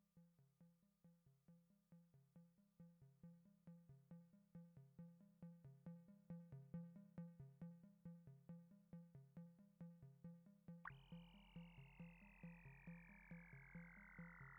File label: Eastwick 3 Timbres bip